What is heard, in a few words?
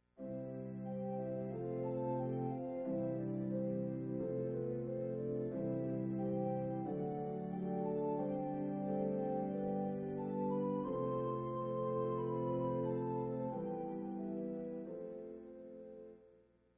church organ